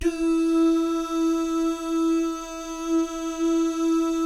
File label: "doo" guys, Vocal Jazz Fx